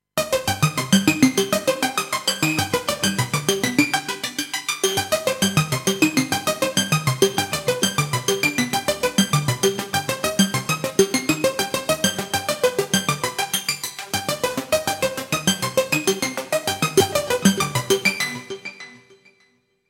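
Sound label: Metal Arpeggio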